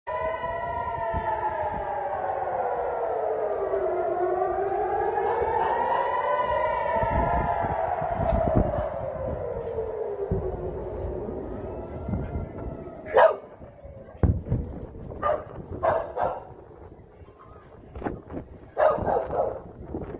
alarm siren in Tehran; آژیر خطر جنگ; تهران

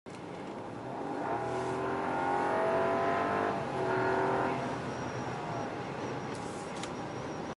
rolls royce

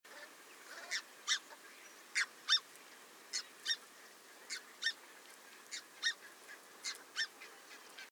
red breasted goose

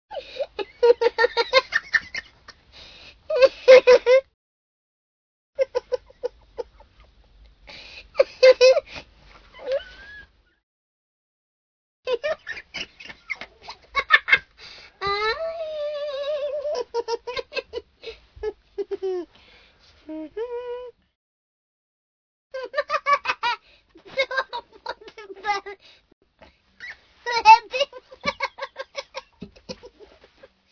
Laughing Baby

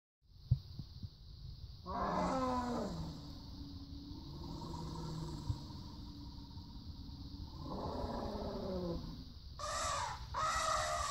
Mammoth

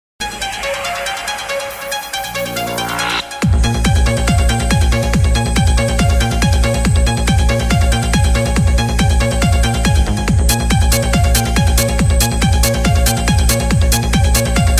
Techno ringtone

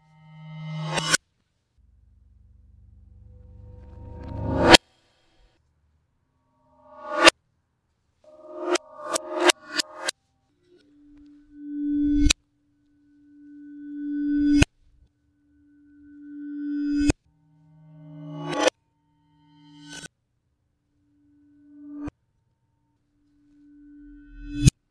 Modern cinematic

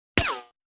Silencer Shot

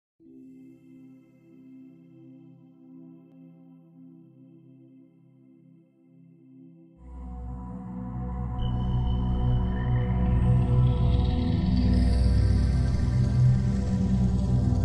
brain waves; مغز انسان; امواج صوتی برای مغز انسان

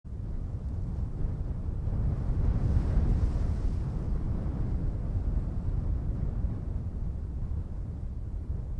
Arabian Desert sound

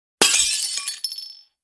Flower pot, شکستن

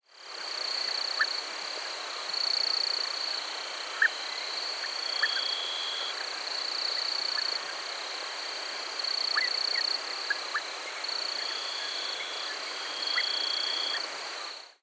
inland dotterel

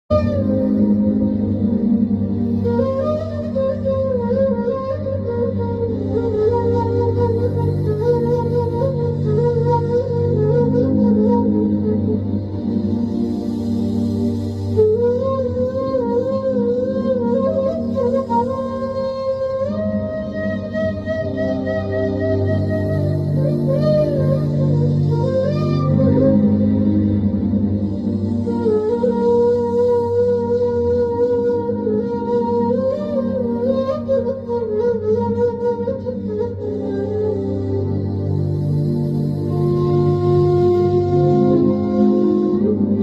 flute

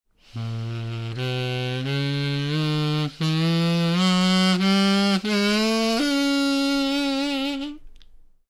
kazoo

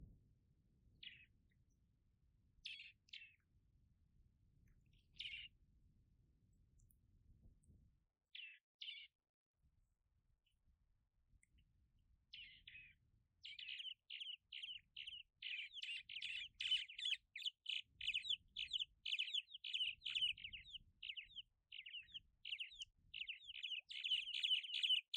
Cuban martin

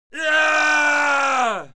battle man

